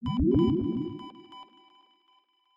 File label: First Aid Medic Health Hp Sci Fi Bubble Beep